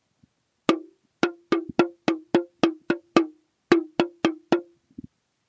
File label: pet taiko